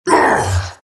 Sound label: morph pain; terrorblade